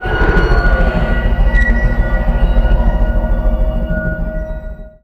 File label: vampire groan